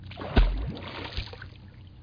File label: Effects Plunge